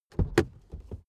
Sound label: suv open door hard quick